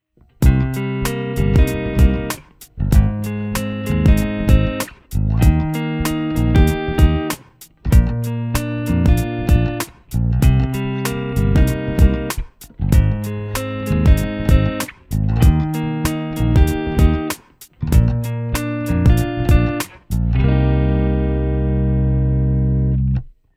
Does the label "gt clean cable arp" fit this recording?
Yes